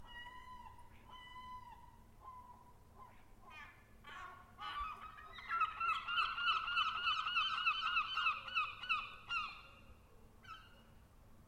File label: seagull cry